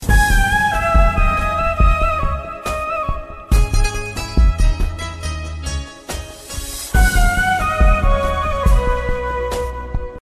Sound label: sad flute bansuri ringtone